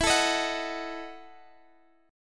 E Major 7th Suspended 2nd Suspended 4th E, Guitar, Half Step Down